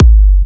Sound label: Big Kick